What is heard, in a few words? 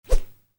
Woosh, Whoosh: Short, Light; Whooshes; Sound Design; Stuart Duffield